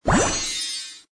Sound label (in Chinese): shuaxin